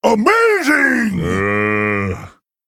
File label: Male Ogre Attack